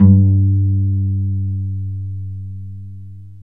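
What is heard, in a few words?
strings violoncello pizzicato, Chamber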